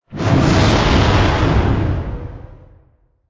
Dragon Loop; Angry Birds Seasons

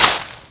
plant collision; Angry Birds Space

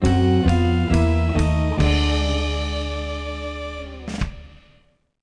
Class Failure Stinger; bully scholarship edition

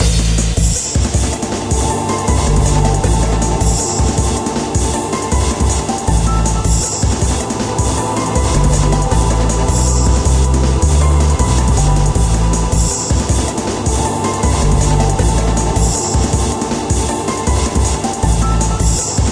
Clean Dnb; Danmaku Death; android

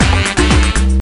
Uh Oh; gamerip; Happy Feet Two; nds

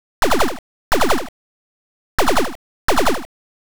Shot Sound; Oretachi Game Center Zoku; Scramble

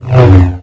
Lightsaber Swing; gamerip; Car Simulator 2; android

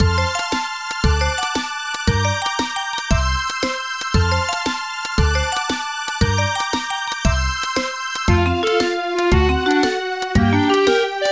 BGM; Imagine Master Chef; Imagine Happy Cooking

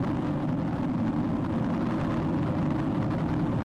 Rocket Loop; Mo Rocket Cyclope; doodle jump 2 android ios mobile 2020

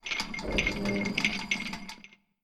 move chains; Kismet

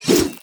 ultimate attack impact

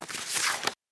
doc appear

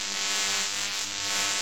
Electric Taser; Smashy Road Wanted 2 android ios switch gamerip 2020

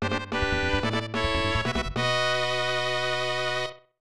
Great Landing; Pilotwings; SNES

